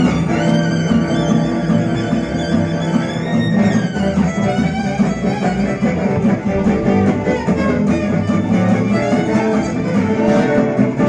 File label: quartet at malvern jazz festival end of fast impressive piece